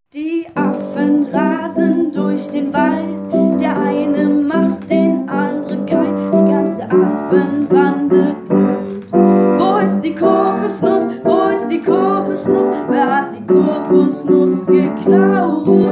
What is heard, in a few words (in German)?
Song Die Affen